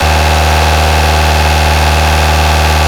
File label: i4 diesel, engines; acf engines